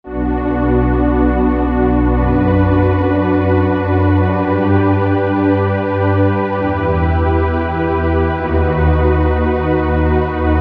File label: Pure Syn Pad